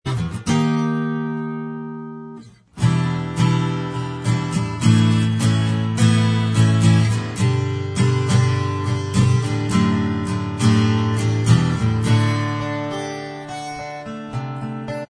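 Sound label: acoustic instrumental, backing track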